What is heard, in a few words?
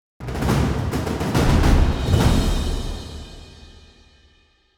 Combat Outro; The Witcher 3 Wild Hunt; Q111 Ciri Replacer